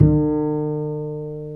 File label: Bass, Roland